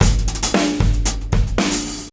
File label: HATS, 115; Dance